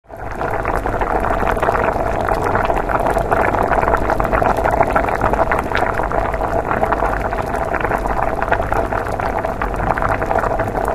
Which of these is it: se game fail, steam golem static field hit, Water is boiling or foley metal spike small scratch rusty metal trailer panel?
Water is boiling